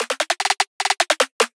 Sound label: Drum Roll 150; Trap and Dubstep